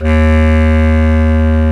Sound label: WND BS CLAR, Bs Clarinet, Orchestral Winds; Roland LCDP04 Orchestral Winds; Lo Clarinets